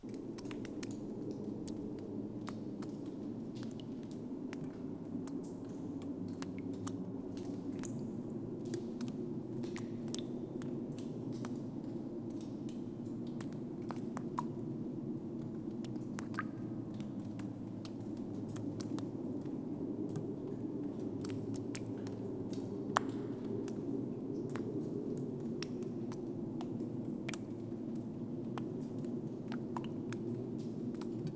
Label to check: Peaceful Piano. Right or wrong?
Wrong